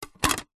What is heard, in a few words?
household plastic plate set down stack on another; zapsplat